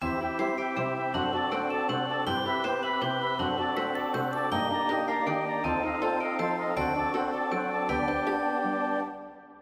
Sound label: Magical Tone Colors Orchestration